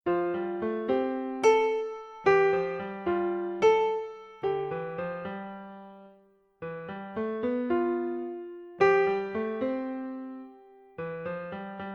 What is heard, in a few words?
fantasy emotional piano version